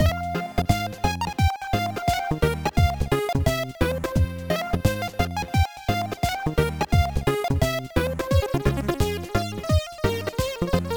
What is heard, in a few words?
waltz, mod; coma favorites modland